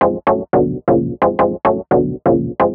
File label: Stab Loops; neuro; Optiv & BTK